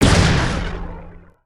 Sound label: Energy Ball Fire; weapons; aronwk collection; ndaudio